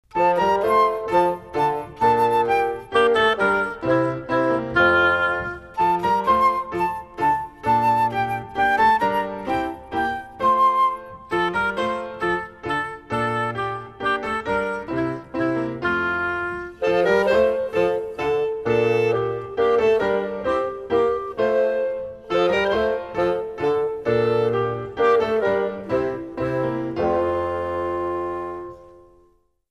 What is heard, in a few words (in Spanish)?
musica